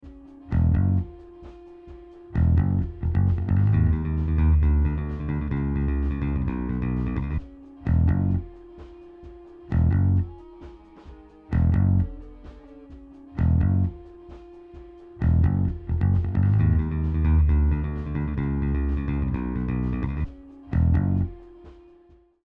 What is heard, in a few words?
Bass